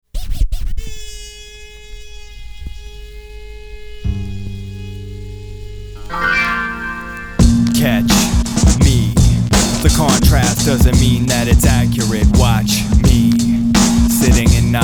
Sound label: Short Strings